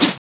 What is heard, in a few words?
sword swoosh